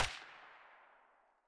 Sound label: silencer mono far